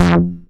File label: bass